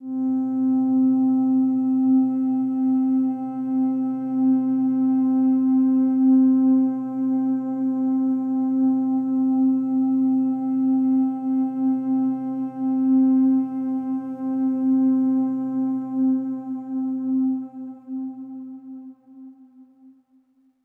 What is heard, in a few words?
Overlay Drone; Sanctuary